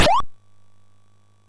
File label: sid MOS6581R4